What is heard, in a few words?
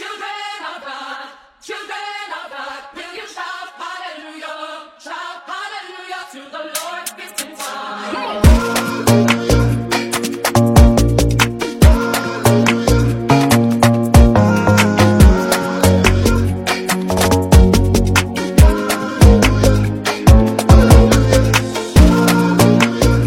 Drill X Amapiano